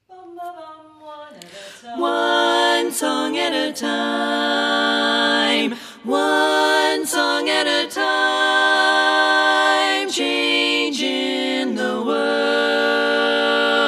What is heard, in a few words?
Bass Omit